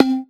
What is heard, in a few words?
E-Perc Bell, Electronic Percussion; Drums; Ableton Live 12 Lite; Core Library